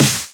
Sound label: Snares; Vengeance - Essential Clubsounds Vol.4